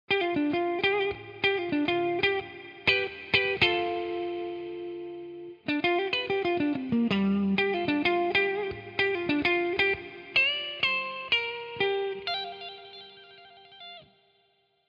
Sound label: les paul wet; chiqui audio